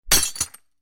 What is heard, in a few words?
vase break; Octodad Dadliest Catch